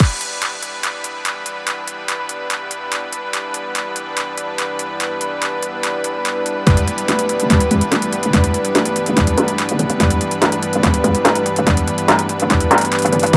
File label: Future Bass Kit